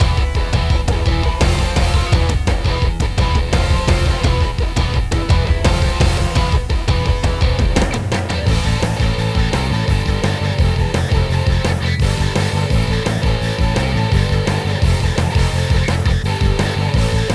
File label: Guitar Music